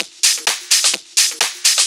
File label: Discohouse Loop 128 BPM